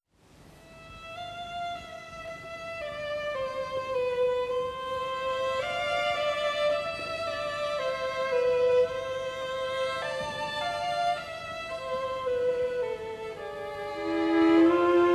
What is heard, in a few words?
String Quartet in A Minor Op.41 No.1 I. Introduzione. Andante espressivo; Schumann; Allegro